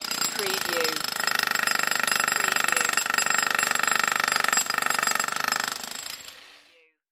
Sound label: pneumatic hammer